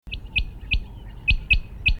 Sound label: coturnix delegorguei, birds calls; phasianidae